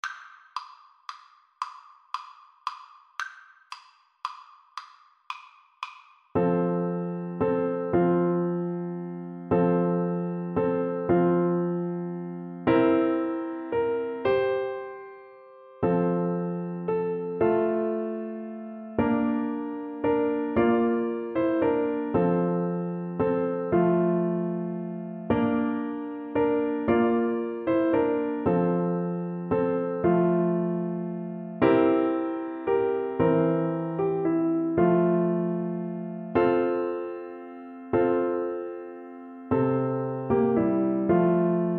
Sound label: Oboe